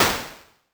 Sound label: death; Incantation